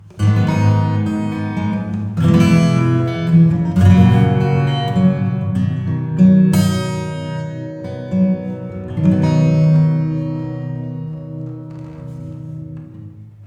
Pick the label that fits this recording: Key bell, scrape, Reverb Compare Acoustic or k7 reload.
Reverb Compare Acoustic